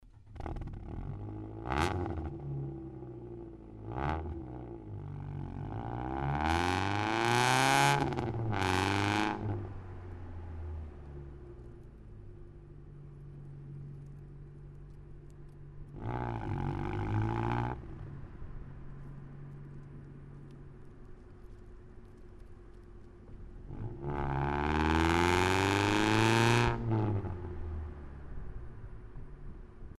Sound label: bumper cold